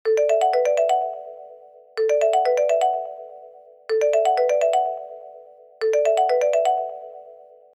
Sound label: ringtone